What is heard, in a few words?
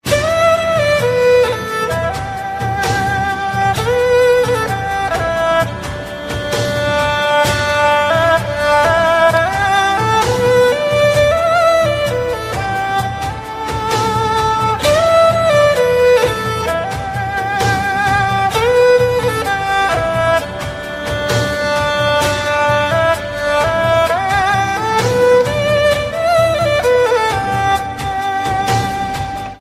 chinese instrumental music